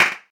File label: clap single shot d sharp key, clap single hit tuned to the D# note. Loudest frequency: 2173; single hits one shots